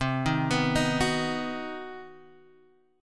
C Minor Major 7th Flat 5th chord, Guitar Half Step Down